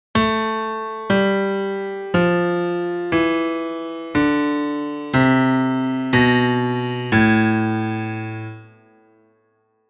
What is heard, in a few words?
A melodic minor descending